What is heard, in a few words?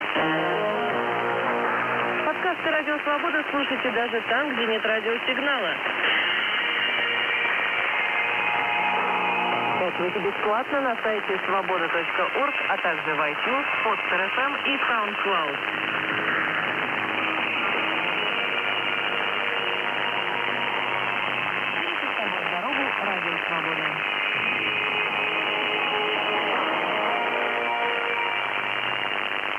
17770KHz; R.Svoboda